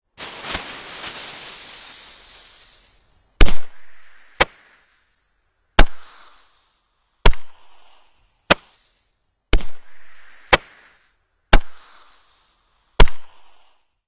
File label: Fireworks firecrackers roman candle multiple launches